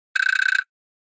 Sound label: Flash Gear Multi High